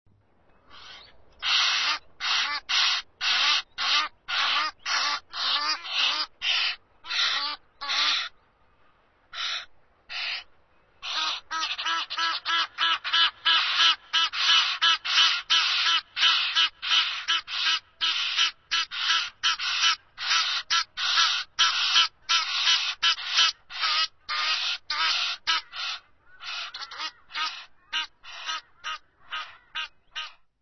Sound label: Egyptian Goose